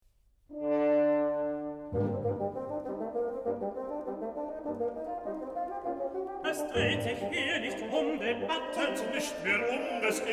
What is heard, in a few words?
Trio; Physignatus; Kenteterion; Struthion